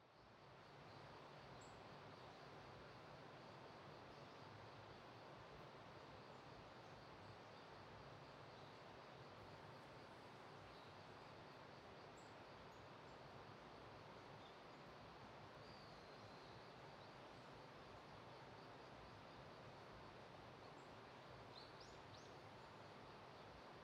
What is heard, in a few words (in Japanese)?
(声無し)ウォーターケアとマッサージ, ASMR; 安眠耐久ASMR